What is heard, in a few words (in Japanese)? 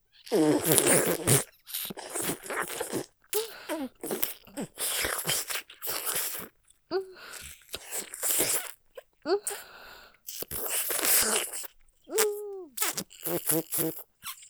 お掃除フェラ 激しくねっとりバキューム吐息; モノラル; ループで楽しむ！シェフの気まぐれ抜き抜きコース